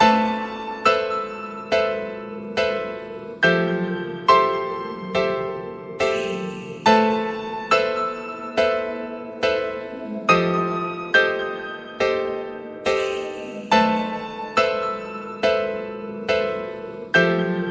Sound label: Mysterious; Family